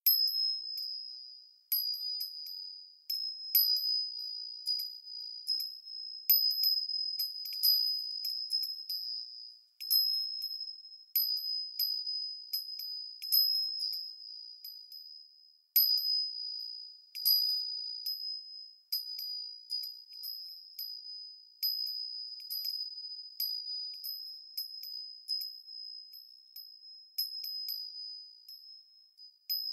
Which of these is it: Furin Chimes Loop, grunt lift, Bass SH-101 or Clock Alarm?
Furin Chimes Loop